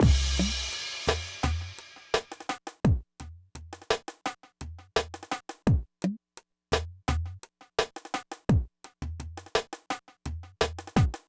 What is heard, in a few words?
Drums, Hip Hop, 085, Amin